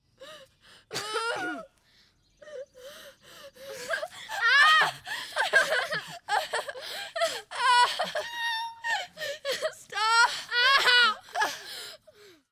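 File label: moans and screams of pain several women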